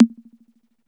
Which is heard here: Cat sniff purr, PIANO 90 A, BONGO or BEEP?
BONGO